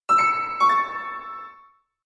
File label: Care Success